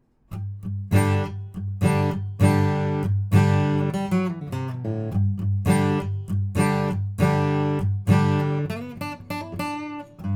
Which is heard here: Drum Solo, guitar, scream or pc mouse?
guitar